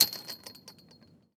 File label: door chain link impact; Rust